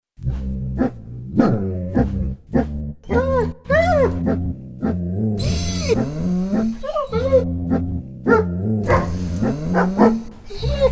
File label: Waltz